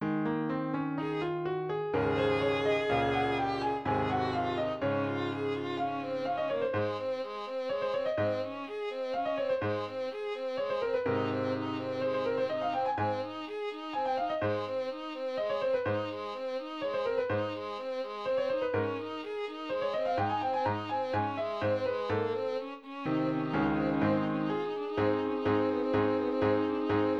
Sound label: violin in many flats